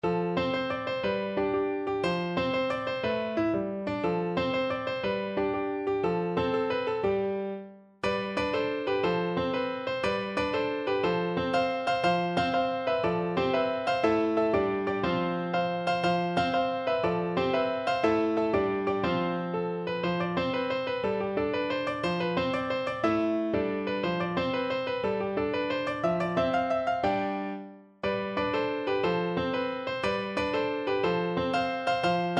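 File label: recorder karaoke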